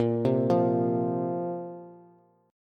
A sharp Suspended 2nd down Bass

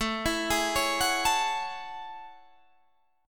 A Major 13th, down Guitar Standard; AM13; arpeggiated; JGuitar

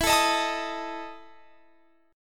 E Major 7th Suspended 4th E down

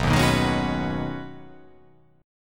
B 6th Add 9th B, chord

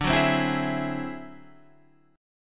D 9th Flat 5th D Guitar Half Step Down

